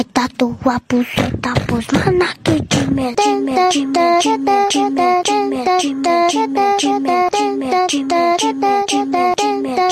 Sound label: phonk tun tun